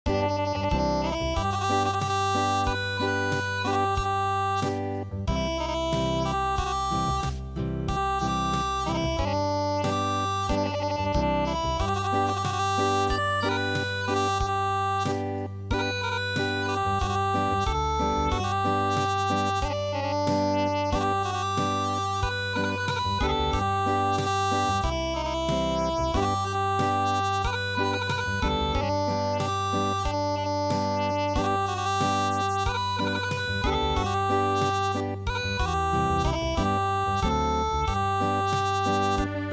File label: Acoustic